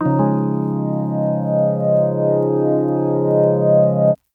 Polysix Solemn Vinyl Synths C#2; vinyl chords

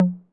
Tom Hi 808 Saturated B

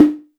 Perc; EFM

